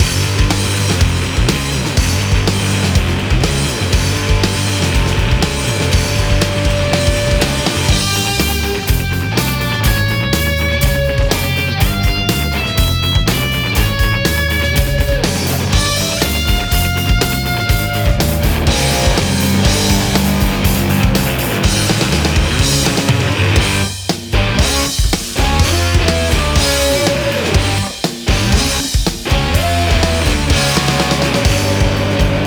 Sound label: Metal Loop; bentleys hackpack